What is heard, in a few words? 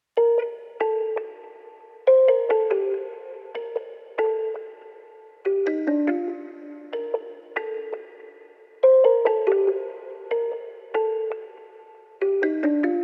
71 BPM G Min Counter Melody; Trap; Melodies; Loop Stems; Cymatics; Trap Starter Pack; Purple Daze